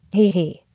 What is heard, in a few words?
Hee Hee